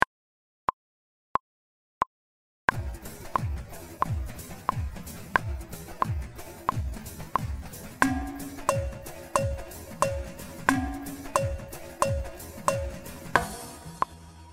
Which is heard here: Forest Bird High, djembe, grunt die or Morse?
djembe